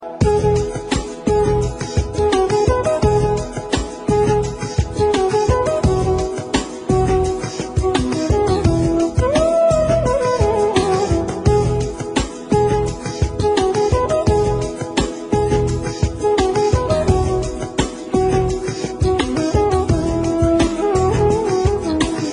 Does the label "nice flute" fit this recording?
Yes